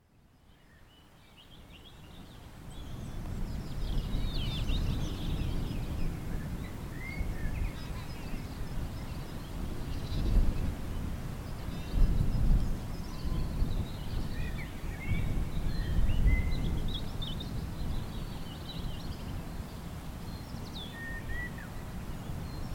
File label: The Waterside Soundscape